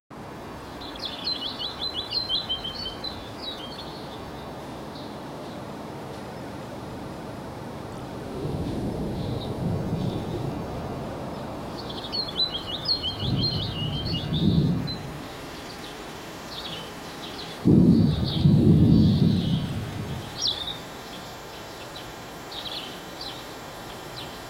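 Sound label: start from silence continue, gale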